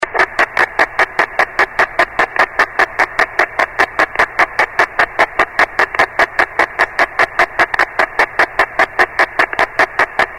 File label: noiz